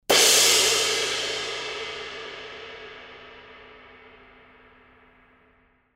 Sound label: K Con Ren Ride Crash, cymbal